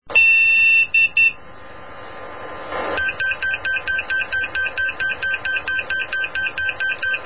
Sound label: beep